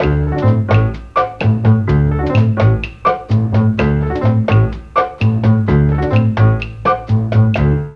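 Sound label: D2 bass strings, Mellotron; BASSA+STRNGS; Mike Pinder - Mellotron Archive CD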